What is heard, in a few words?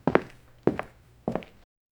slow footsteps on a hard; hard floor